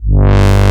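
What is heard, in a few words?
brass G2, synthesizer, analog brass; AKAI S6000 CD-ROM - Volume 1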